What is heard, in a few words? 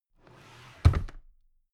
drawer cabinet carton close heavy